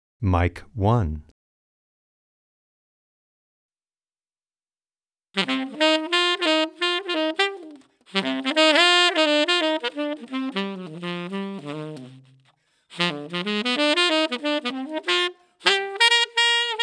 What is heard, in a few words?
Saxophone